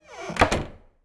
dvere zavreni; Mafie 1

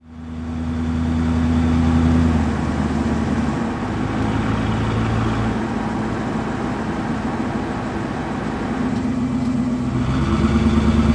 nákladák; Mafie 1

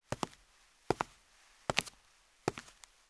kroky; Mafie 1

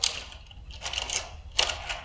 drap; Mafie 1

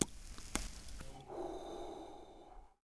doutníček; Mafie 1

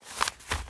noviny; Mafie 1

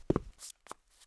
konec krok; Mafie 1

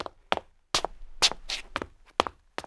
dobeh; Mafie 1

